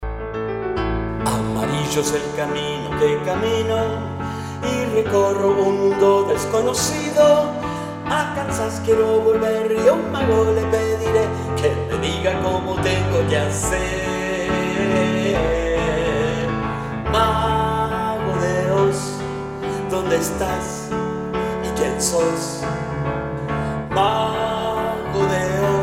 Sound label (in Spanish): REFE VOCAL; audiciones; convocatoria a audiciones el mago de oz